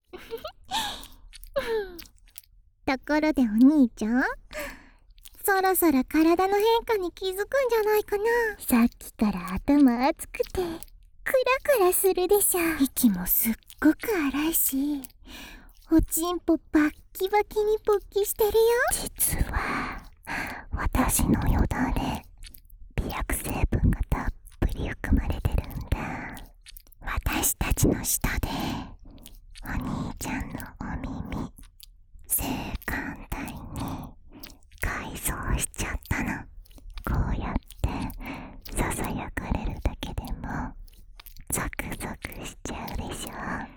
囁き耳舐め; 双子サキュバスの強制連続搾精♪

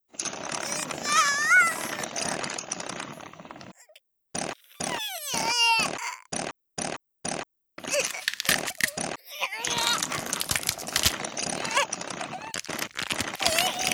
歯車巻き込み

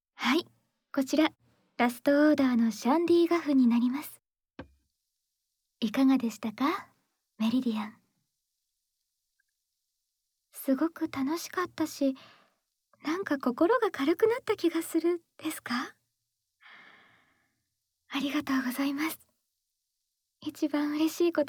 炭酸ASMR・呼吸音; さよならはシャンディガフと共に

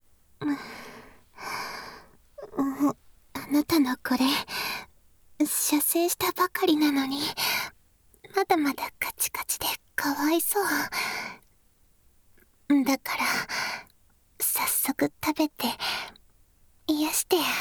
フェラ

